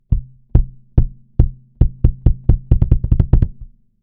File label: Kick; smart EQ 3